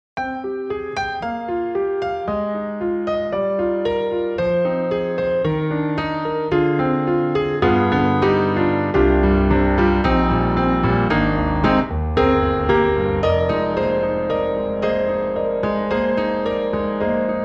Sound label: Grand Rhapsody Piano, Mellow Concert Grand; Waves